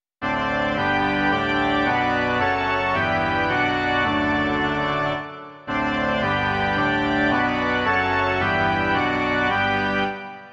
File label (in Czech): varhany; Hospodine hodný chvály